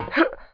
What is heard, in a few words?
ladder; Daikatana; mikiko